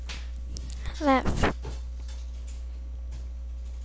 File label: laugh